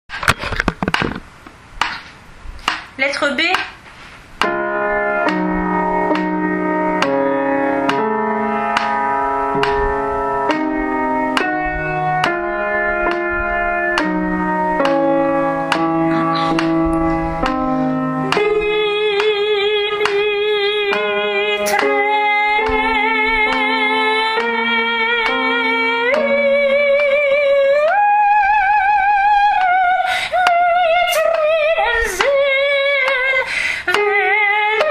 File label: soprano, Requiem allemand de Brahms; lettre B